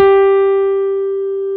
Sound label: CP70; USB Soundscan vol.09; AKAI; Keyboards Old School